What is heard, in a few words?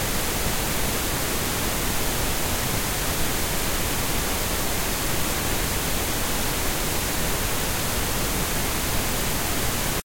Pink Noise File, 44100hz 16-bit stereo